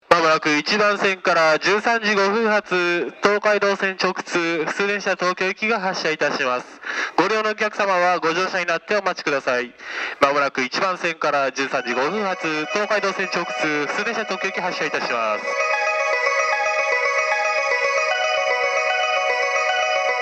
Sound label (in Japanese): 発車メロディー 余韻切りです。上り普通列車の発車です。